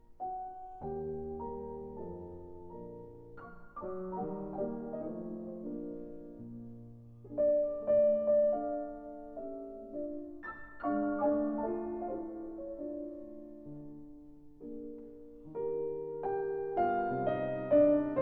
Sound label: Carnaval for piano; Schumann